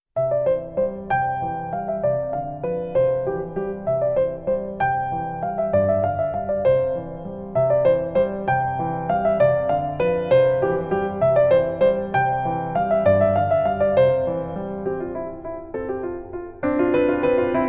Piano Sonatine No1 In C Major Op36 - III Vivace; Clementi; 클레멘티 피아노 소나티네 1번 다장조 작품번호 36 - 3악장

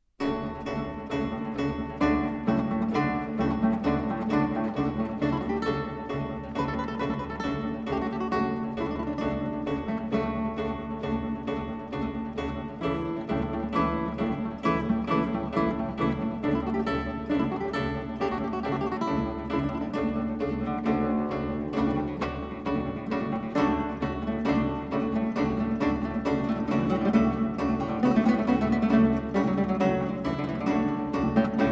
Guitar Allegro marziale

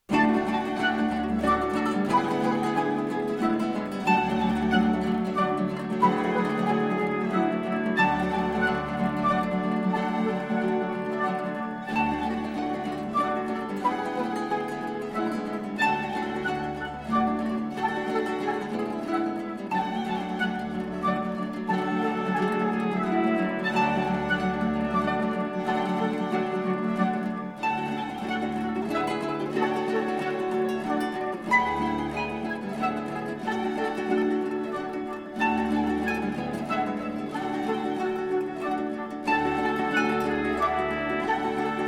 Guitar, Pastoral allegro

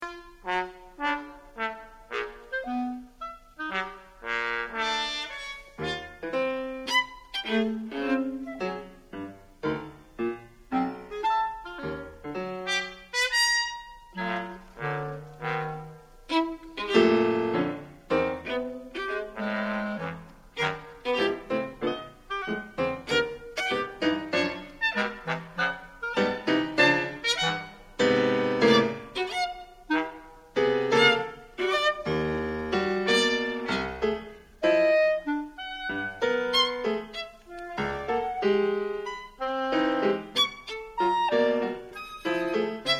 Concerto for Nine Instruments Op24 - Sehr rasch; Webern

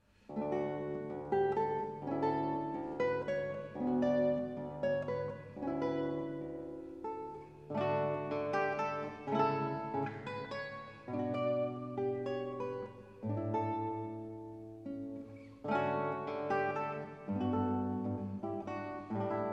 Guitar; El noy de la Mare

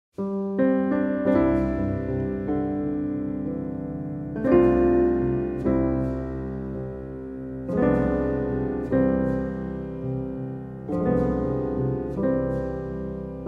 Piano Foot Steps; Newage

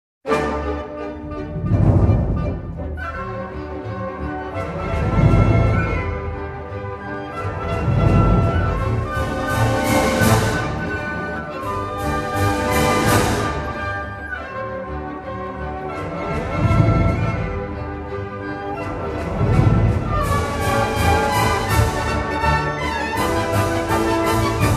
Thunder And Lightning, Polka Op.324; Johann Strauss

